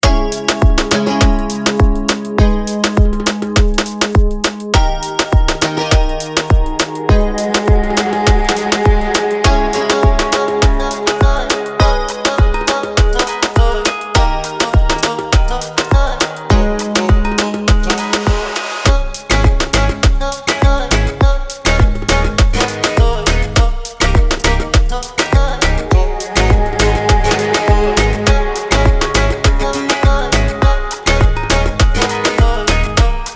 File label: latin vibes pop